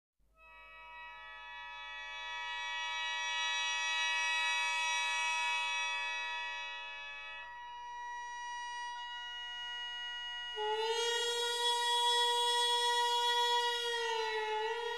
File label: japanese traditional gagaku; hyojo netori